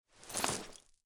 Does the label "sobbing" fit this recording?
No